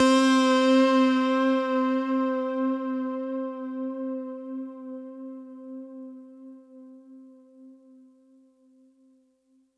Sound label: Synth C, Synth Prophet One Shots; Electronisounds